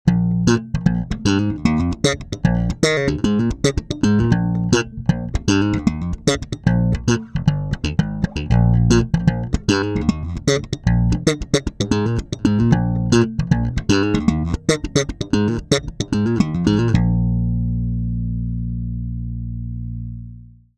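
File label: slapped, bass; phil jones double four bg-75; fodera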